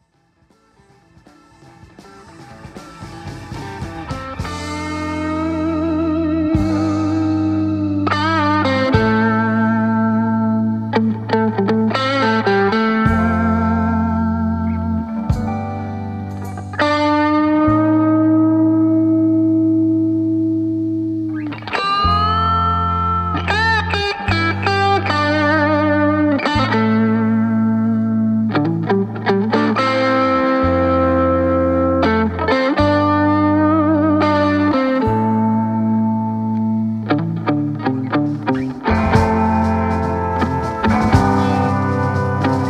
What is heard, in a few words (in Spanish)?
Guitarra Electrica